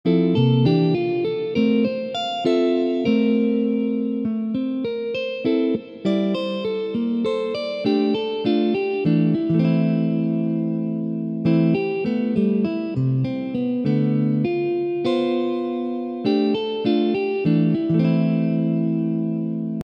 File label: etude, jazz guitar